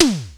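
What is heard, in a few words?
snare synth, Yamaha Motif ES-Series; 300 Drum Machines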